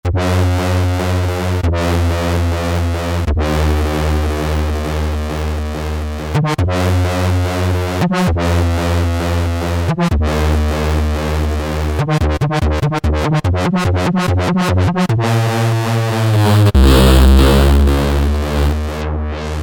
Bass, Novation XioSynth 25

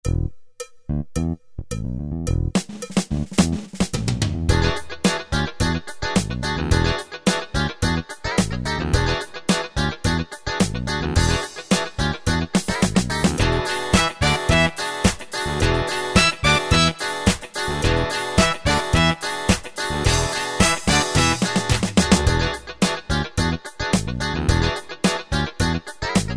Disco Funk; Preset Styles

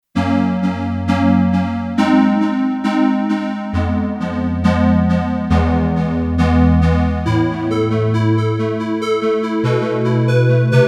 Prophet Square, Kurzweil K2500X; Factory Programs

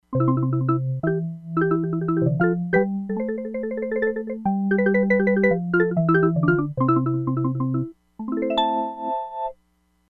MARIMBA, Crumar Bit 99; Factory Programs

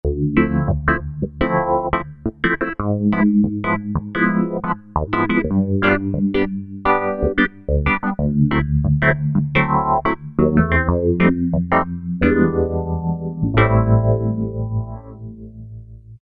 Funky P, Roland V-Synth; Factory Patches

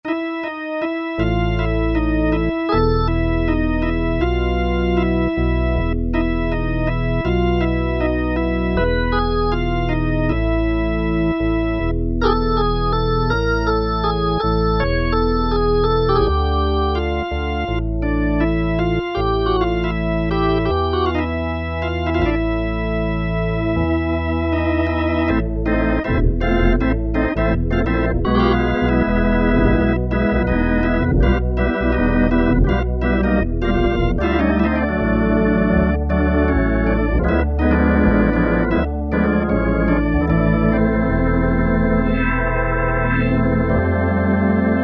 Drawbar Organ, Kurzweil K2000; Kurzweil K2000 Contemporary ROM

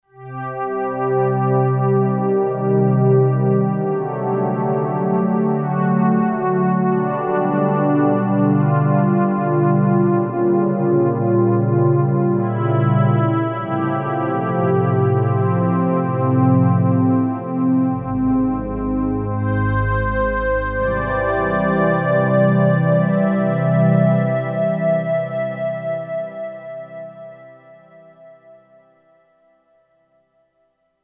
Sombre Winds; Factory Presets; E-mu Proteus-2

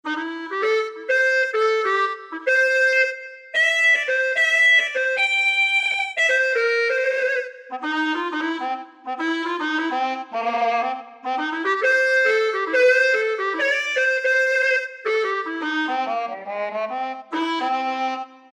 Generalmusic Equinox

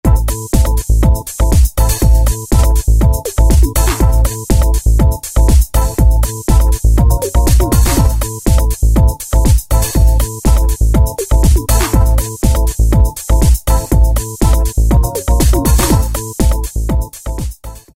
House Pattern, Roland MC-303

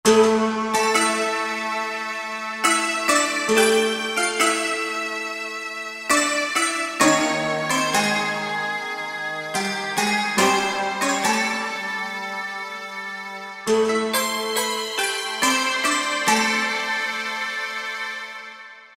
Intar Melody; World; Roland SR-JV80-05

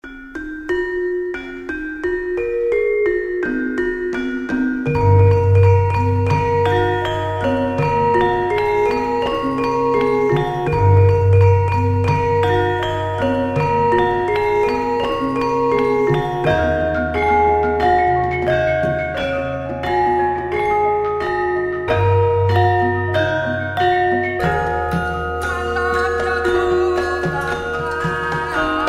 Gamelan Orchestra Phrases; Spectrasonics Heart Of Asia

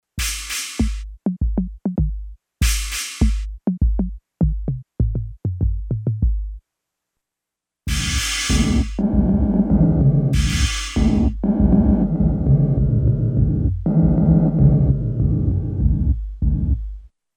gated, 300 ms

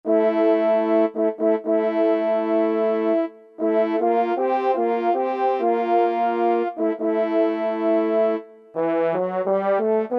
FR.HORN; Orchestral Winds; Roland SN-U110-06

